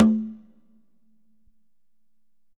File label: Congas wet, drums percussion